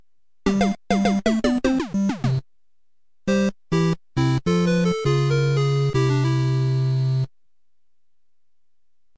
Lose a Life & Game Over; PiCTOBiTS; SMB

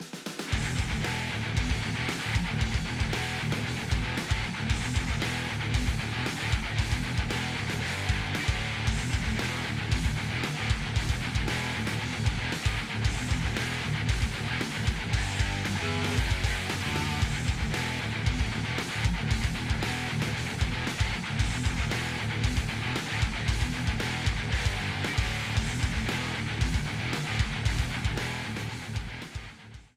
Boot Car Superboost

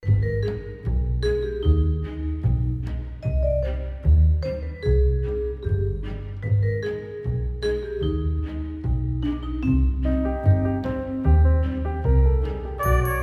Basement Theme; LM3

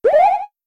Item Shippo Swing; MK7

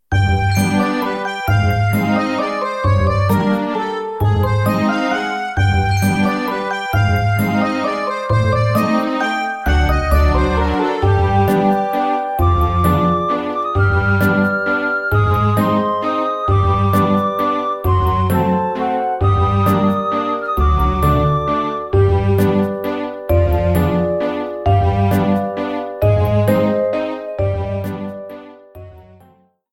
Creepy Theme; MPIT; Kameks

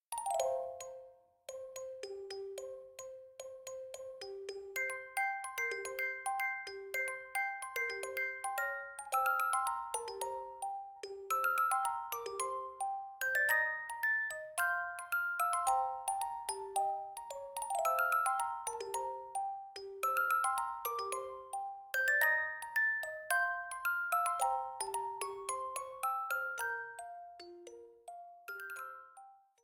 Desert Night; SMM2; NSMBU

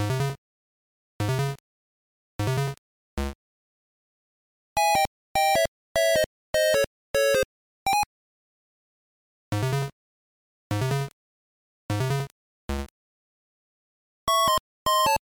Zombie Wario; WL2

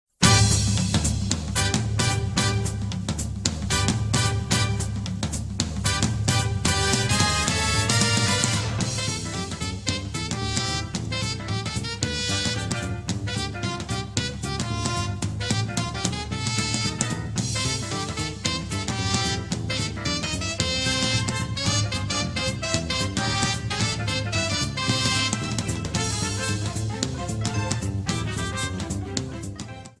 Staff Credits Theme; SM3DL

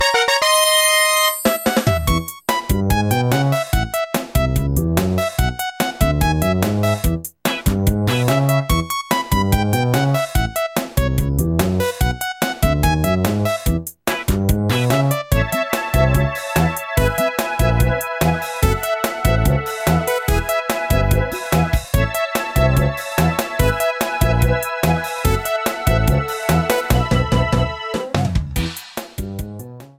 VS Victory Theme; DMOR

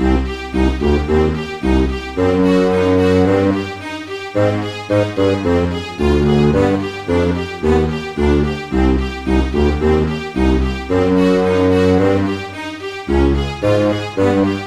Villain

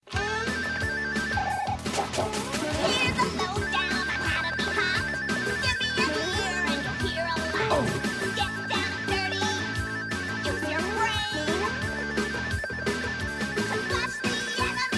Song; Sizzle

